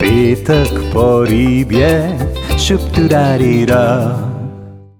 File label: Jingle; ARGETA FishFriday